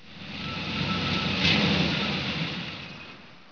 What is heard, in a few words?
engine